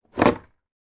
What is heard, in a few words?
household plastic milk bottle remove from fridge door